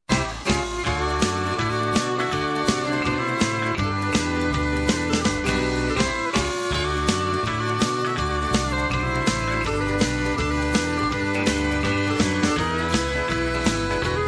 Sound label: Walking Bass Country Shuffle, bluegrass music; totalmedia; Album: Country Music